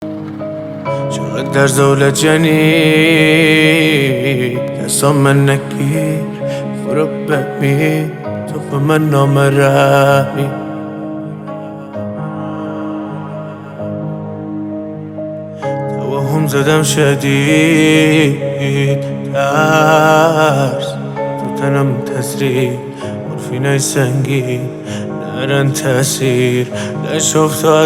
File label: Piano Version